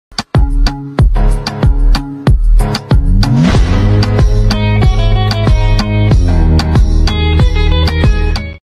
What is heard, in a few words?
hip hop; موسیقی هیپ هاپ بی کلام